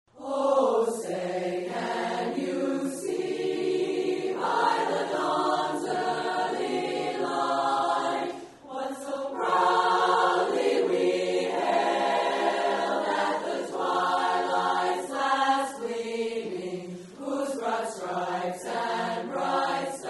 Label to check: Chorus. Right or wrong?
Right